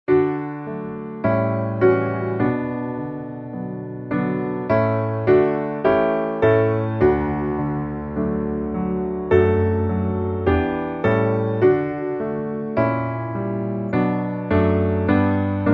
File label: piano